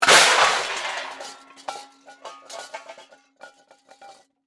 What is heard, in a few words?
Crash Metal Pots, Crash, Metal, Pots, Pans; Crashes (Sound Effects:Objects); SoundEffects-BlastWave